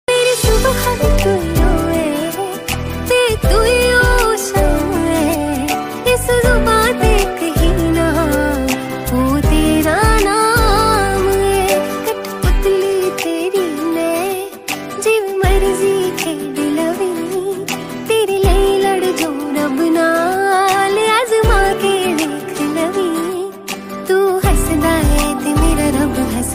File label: Female Ringtone